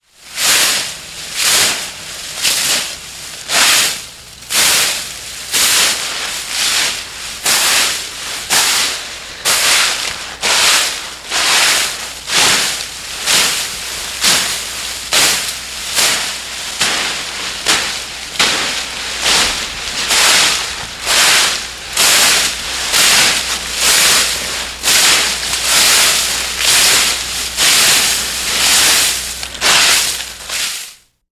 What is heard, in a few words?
household raking leaves; sound effects one